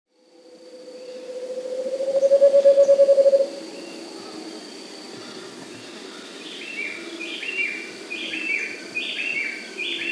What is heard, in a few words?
Scaled Antpitta (Grallaria Guatimalensis), Andean Birds; S. America